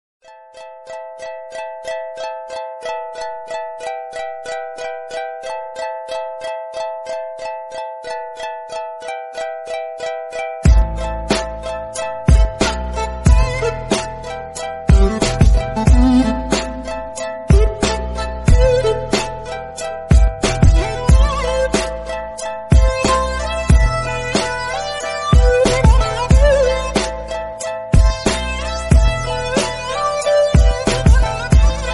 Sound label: trap instrumental song